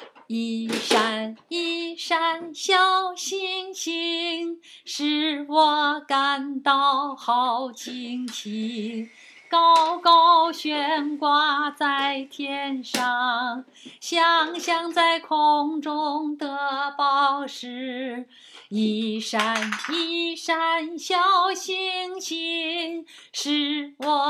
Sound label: Chinese Twinkle